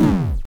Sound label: player damaged; bad time simulator